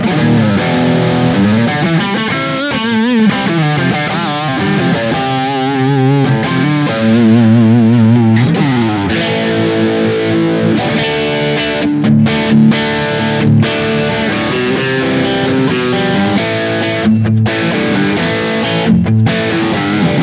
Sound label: Ibanez AZR420 Humbucker Neck to Bridge Highgain